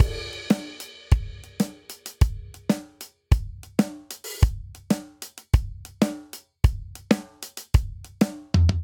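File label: Simple Seven Verse Variant 7/8 190 bpm, drums; Ableton; Factory Packs; Drum Booth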